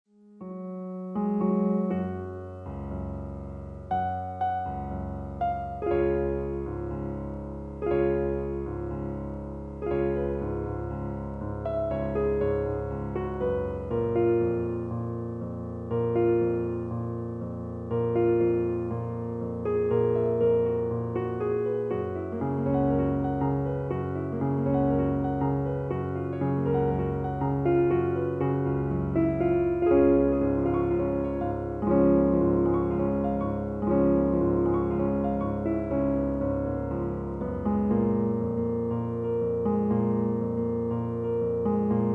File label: Piano